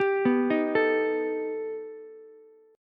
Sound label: B Augmented 7th B down Ukulele; arpeggiated; JGuitar